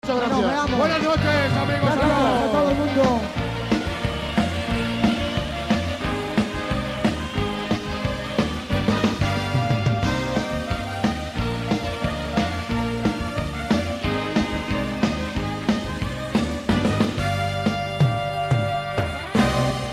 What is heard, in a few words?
Concert